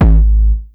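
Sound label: bass drum distorted; 300 Drum Machines; Yamaha Motif ES-Series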